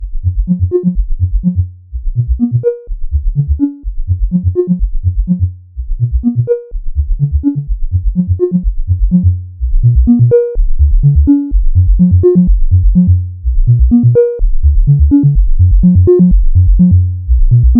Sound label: SINE pattern